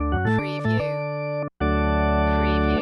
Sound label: Hockey Organ Charge